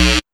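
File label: buzz chord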